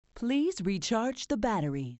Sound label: Battery low; LG; AX585-Rhythm